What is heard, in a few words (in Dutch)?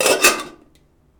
Metaal